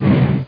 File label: gear